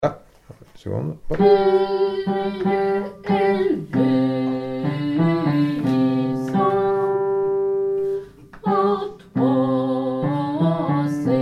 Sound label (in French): Kyrie Basse; ectenie paix